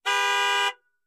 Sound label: Expedition Horn Honk, ford expedition